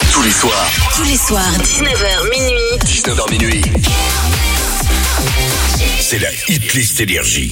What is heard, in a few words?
Jingle; NRJ